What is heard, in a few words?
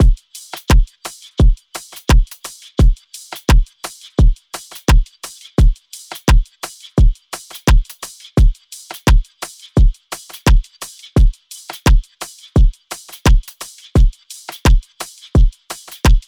Drum Loop 86 BPM; Cymatics; DOPE DRUMS VOL. 1